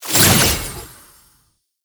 Summon Rune Pick Up; Warframe; Uriel; Vythelas

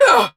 Pain, Battle; Warframe; Dax; DaxRanged; en

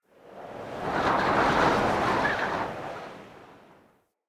Prairie Day Wind Gust Soft

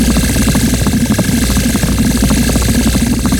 Laser Sweep Loop; Enemies; Weapons; Warframe; Arachnoid Camper

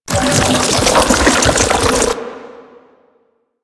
Proto Infested Babau Tentacle Flail Loop, Enemies

